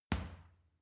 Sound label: boxing punching bag uppercut soft